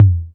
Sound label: Tom; Percs